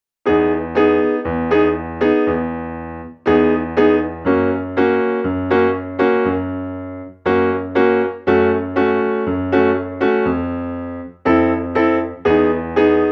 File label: bossa